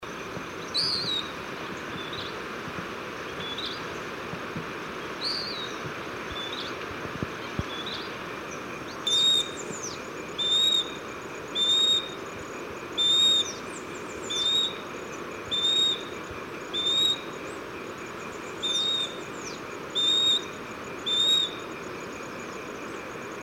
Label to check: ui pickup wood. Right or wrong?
Wrong